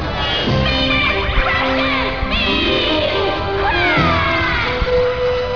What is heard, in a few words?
beam